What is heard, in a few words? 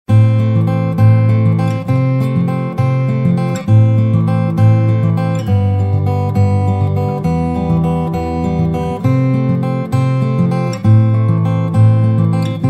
acoustic guitar; hold music for callers on cell phones